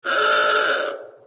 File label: horn; There; ThereClient